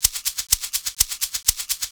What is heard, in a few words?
mix percussion loops, 125; PS-28 Vocal House Samples